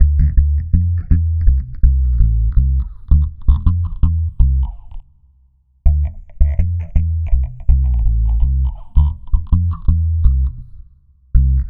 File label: Dub Bass, Electric Bass